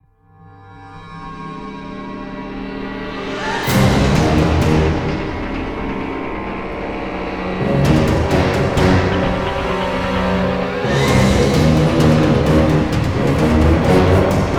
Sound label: Music Danger Alert; starcraft 2 wings of liberty; MissionMusic